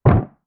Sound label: wooden collision small dry dull; FMOD; Interactables